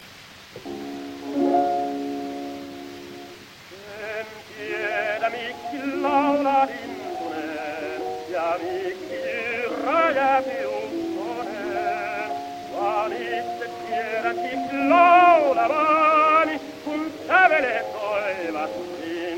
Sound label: Gramophone